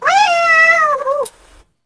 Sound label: smash cat; monsterplex